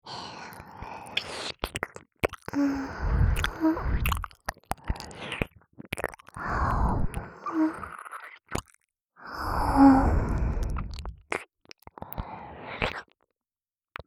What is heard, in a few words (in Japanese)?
耳舐め (交互)